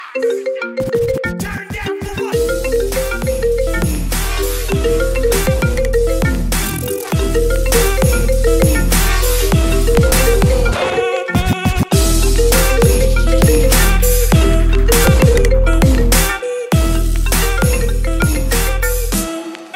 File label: iPhone Turn Down Ringtone